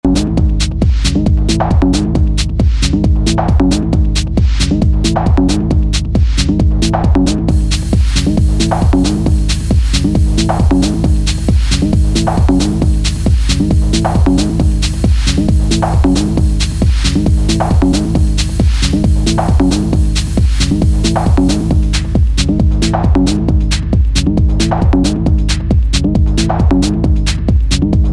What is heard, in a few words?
sped up 135 bpm; la techno sans filtre deconstruire les cliches